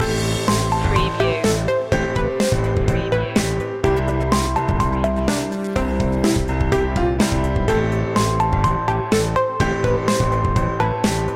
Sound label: summer drive loop